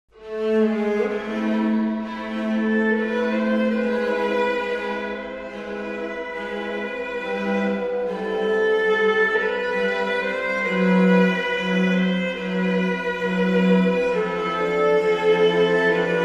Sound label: String Quartet